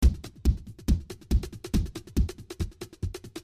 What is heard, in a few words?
Kickin' 140 intro